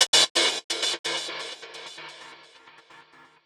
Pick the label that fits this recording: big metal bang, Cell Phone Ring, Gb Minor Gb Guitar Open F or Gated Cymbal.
Gated Cymbal